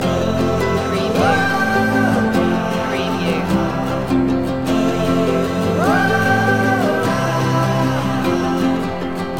the nu-folk tune loop

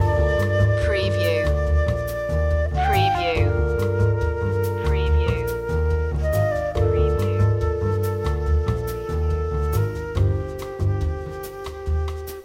loop

